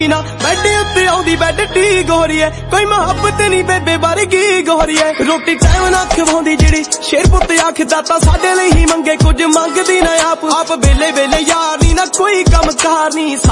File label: Family Punjabi Song Ringtone